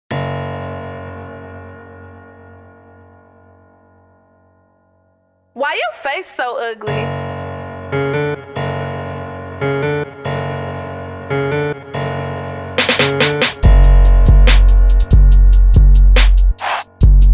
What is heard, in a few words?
INST 71BPM Dbm